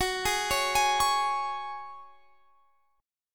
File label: Db Suspended 4th Gb